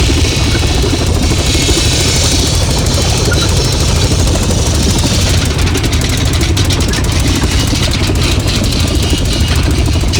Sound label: Shredder Teeth Loop